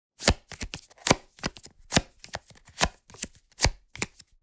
Flipping through cards board game

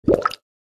Magic water bubble pop potion

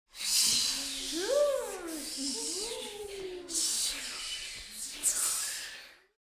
Ghost children horror atmosphere

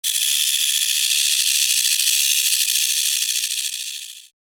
Rattlesnake tail rattle

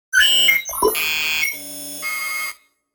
Futuristic tone authorization error

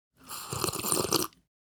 Cartoon slurp; cartoon eating

